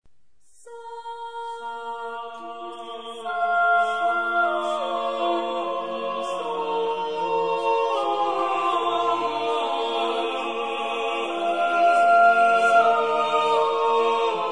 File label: Missa Vox clamantis Sanctus Benedictus, chorus; Duarte Lobo